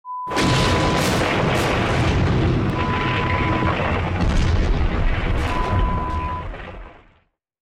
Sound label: Explosion sound